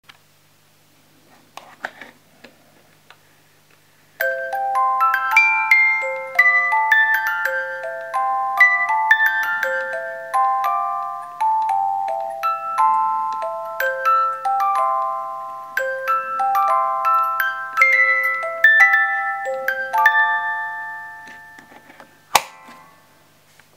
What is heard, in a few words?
Music box; Breakfast at Tiffany's; Invenio Crafts